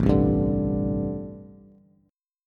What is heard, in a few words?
A Minor 11th A Bass Standard, Chord; Am11 strummed; JGuitar